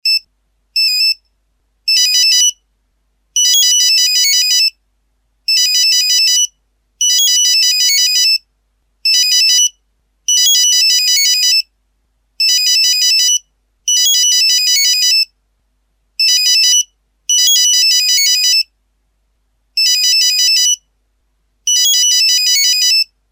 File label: classic tune